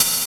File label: snare+hat; DRUMS